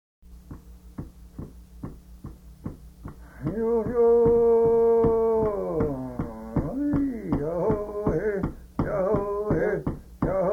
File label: Stomp Dance; Music Atlas Volume1